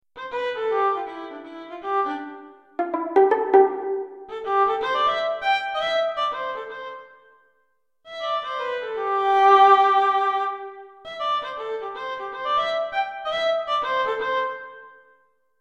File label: Violin; Violin Solo Lite; sibeliusedu; playback